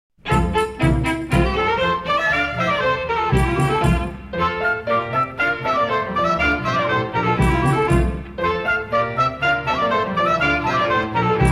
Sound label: maypole dance (instrumental)